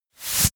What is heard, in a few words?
wipe